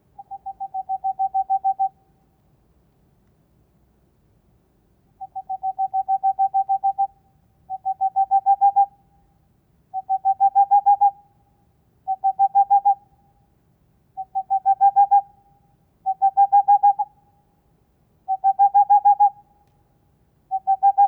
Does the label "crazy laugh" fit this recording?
No